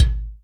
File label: Mtl Point, Yamaha DTXpress Electric Kick